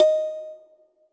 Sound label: Kalimba D#4